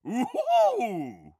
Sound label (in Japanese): うーほほぉ！